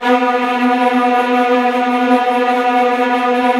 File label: STR TREMOLO, Strings